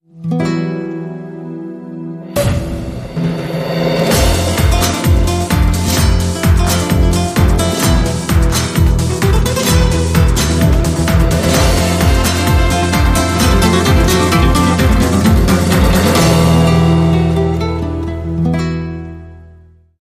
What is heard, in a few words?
Flamenco